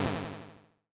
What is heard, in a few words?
curse; action RPG game; Adventures in Lestoria; Sig Productions